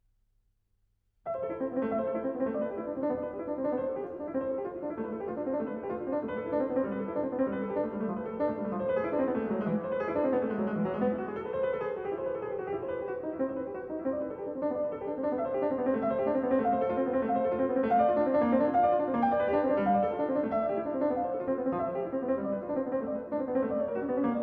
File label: Prelude in A minor, Piano